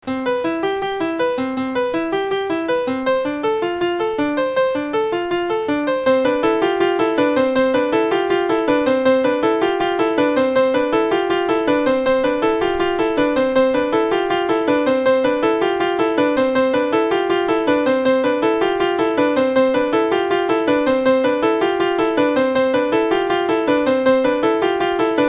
tonleiter illusion; tonleiter illusionm

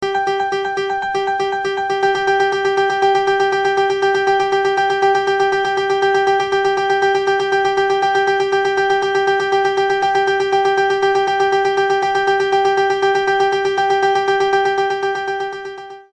oktav illusion

